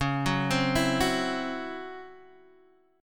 C sharp Minor Major 7th Double Flat 5th, Guitar, Open D; 11,9,10,10,9,x